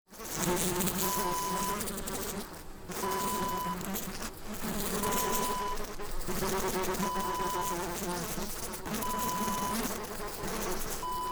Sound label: flys